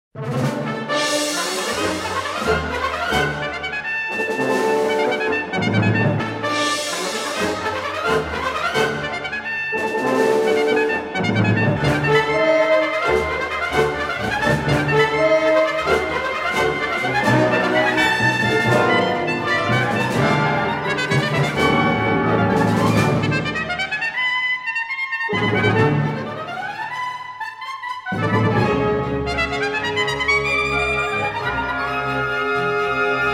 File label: Bass Suite